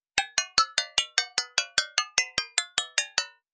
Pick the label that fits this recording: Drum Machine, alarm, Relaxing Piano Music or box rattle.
Drum Machine